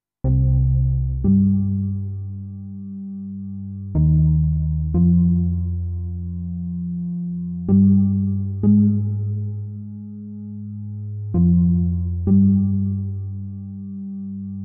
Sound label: Click Syn Bass